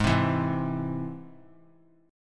G sharp Augmented G sharp; G#+ strummed; 20,19,19,18; JGuitar

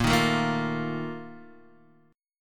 Bb Major 7th Suspended 4th, Guitar, Open D

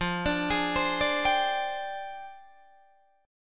F Minor 6th Add 9th Guitar DADGAD; down; chord shape

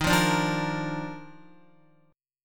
Eb Major 7th Suspended 2nd, Guitar; strummed; chordshape; JGuitar

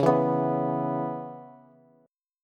Db Minor 7th Db down Bass, Dbm7 chord; strummed; JGuitar

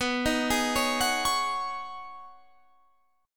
B 6th Add 9th B Guitar Standard, chord; B6add9; 19,18,18,18,19,21; arpeggiated; capo=2

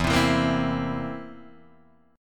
Bb Suspended 2nd Flat 5th

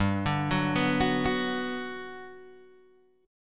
G Major 7th, G, down, Guitar, Open G

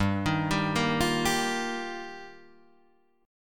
G Minor 7th Sharp 5th G, Guitar Half Step Down

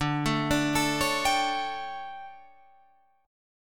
D Major 7th Suspended 4th, Guitar, Open F; DM7sus4 arpeggiated

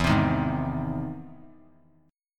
E Augmented Major 7th E down; E+M7 strummed; JGuitar

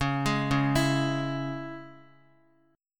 C sharp Minor, Guitar; C#m; arpeggiated; JGuitar

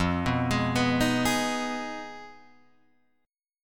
F Minor 7th Flat 5th F down Guitar Open G

